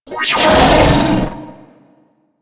Auto Turret Agent Open; Warframe; Venus Turrets

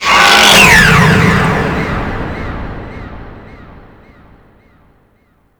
Vay Hek Frame Charge Roar, Vocals; Warframe

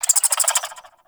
Bird Clicky; Warframe; Eidolon Plains; Ambience

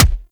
Punch, weapons; Warframe; Scaldra AK

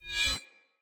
Spear Whoosh Accent; Weapons; Cleansed Spear

